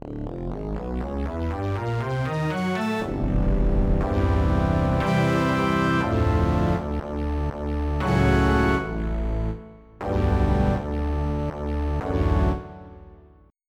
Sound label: Synth Organ; Onj's Soundfont